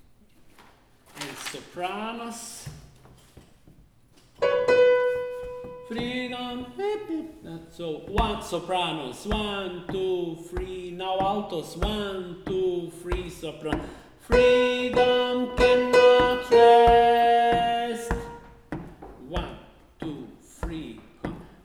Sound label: Soprano or Altos